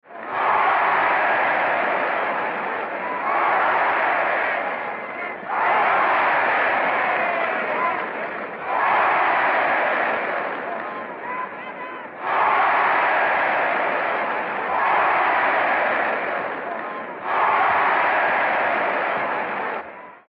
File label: ambi corrida